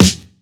smooth snare one shot f sharp key

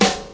good steel snare drum g key; snare drum samples

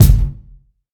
big kick drum one shot g key

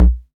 natural kick sample a sharp key; kick drum samples

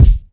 clean kick drum single hit e key; kick drum samples

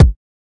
00s thick kick drum sound a sharp key; kick drum samples

